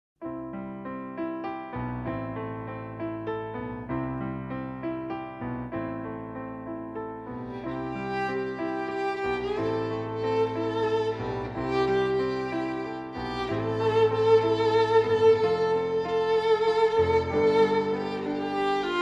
wedding violin violin cover; from film shrek

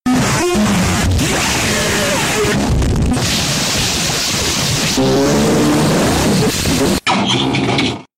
noise

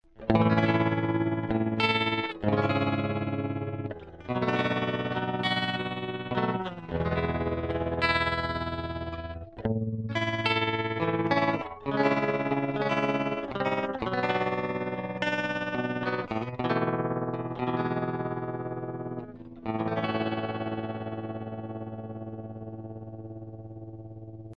stompbox tremulus fast; 4heads

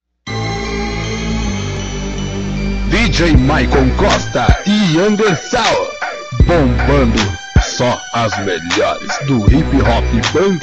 soul

